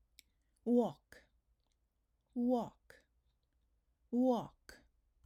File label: walk